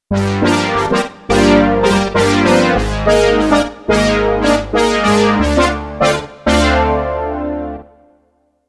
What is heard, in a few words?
brass JX3P; micromonsta2